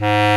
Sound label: Clarinet & Bass Clarinet; OMI - Universe of Sounds; EII Factory Library